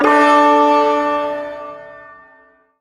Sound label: Ersatz Shawzin Chromatic Chord C; Music; Chords; Warframe; Duviri